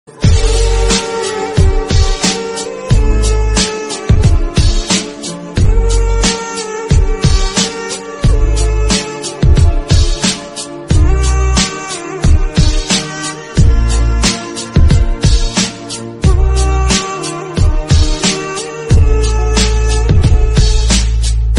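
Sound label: instrumental background music; oduvil unnikrishnan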